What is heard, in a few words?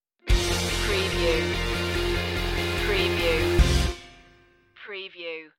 rock killers stinger